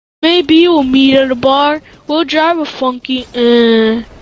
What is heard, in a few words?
Funky ehhh